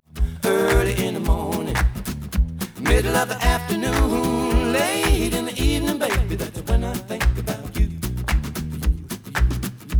Stereo output noised; 3bit

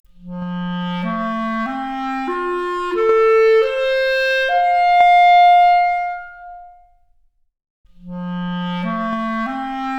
Clarinet arp, Stereo output noised; 3bit

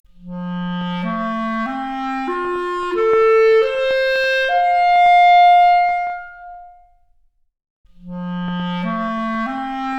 Clarinet arp, Stereo output noised; 4bit

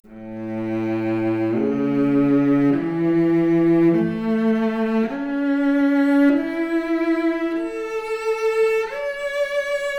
Violoncello arp, Stereo output noised; 3bit

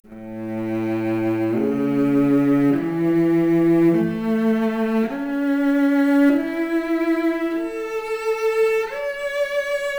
Violoncello arp, mp Stereo output noised, 3bit

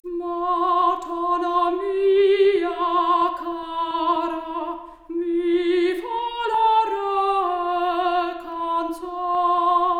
Soprano Stereo output noised; 4bit

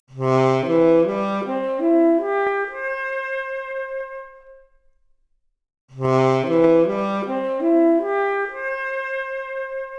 Saxophone arp, mp Stereo output noised, 4bit

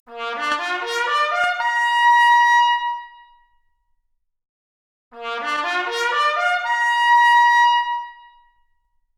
Trumpet arp, Stereo output noised; 4bit

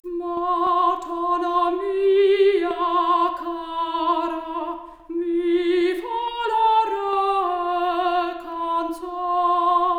Soprano Stereo output noised, 3bit

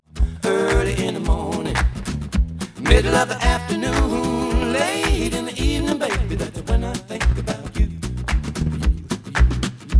noised; 4bit

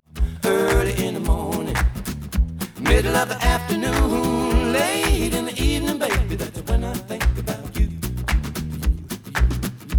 Stereo output noised; 4bit

